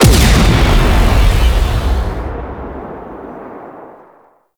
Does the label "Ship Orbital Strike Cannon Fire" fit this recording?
Yes